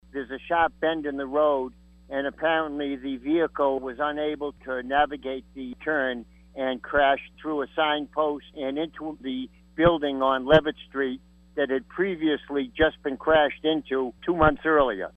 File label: brass